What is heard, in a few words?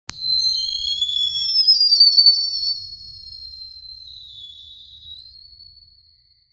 radiant light shining